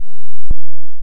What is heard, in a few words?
smooth inv saw dist, shapes; lmms